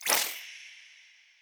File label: fish heal